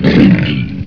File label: pain, ogre; svencoop; legends